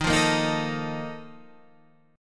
A sharp Major 7th Suspended 4th

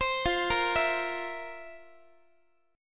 F Major 7th Suspended 4th, Guitar; arpeggiated

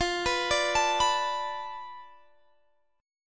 B Minor 7th Flat 5th F, guitar chord

